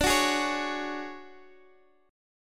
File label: D Major 7th Flat 5th D down Guitar Open G